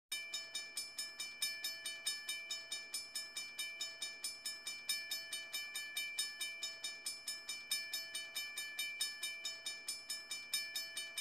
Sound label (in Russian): zvuki zheleznodorozhnogo pereezda